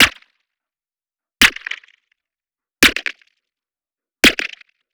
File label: snare grainsnare kit; Polyend; Recue